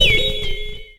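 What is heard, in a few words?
weak hit in a cartoon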